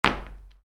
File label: Soccer Boots: Individual Footstep On Concrete; football boot; Human; Footsteps; Stuart Duffield